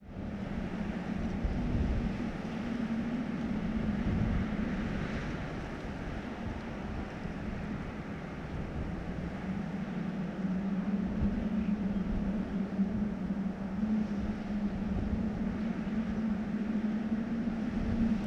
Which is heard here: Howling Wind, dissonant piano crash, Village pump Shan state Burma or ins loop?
Howling Wind